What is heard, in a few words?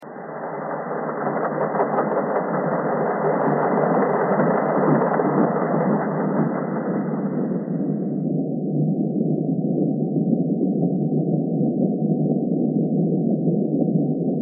thread simple